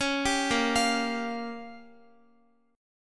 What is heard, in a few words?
Bb Minor 6th, Guitar; Bbm6 arpeggiated